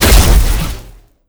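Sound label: boss explosion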